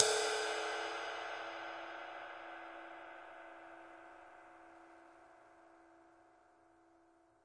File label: big ride, Big Believable Rides; single hits